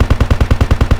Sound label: polycraft loop far